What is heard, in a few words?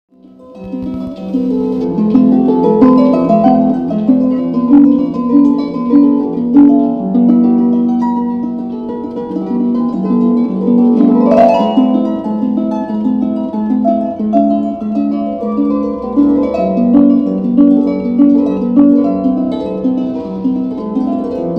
Harpist, Market Street; Life on Market Street: An Audio Archive